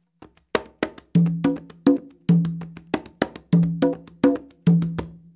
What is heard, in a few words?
guanganco, congas